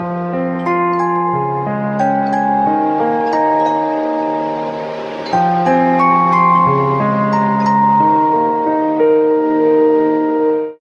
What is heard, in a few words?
piano, sad loop